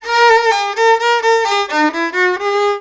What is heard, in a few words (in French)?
VIOLON, ArabianTraditions; USB Soundscan vol.43; AKAI; VIOLRIFF1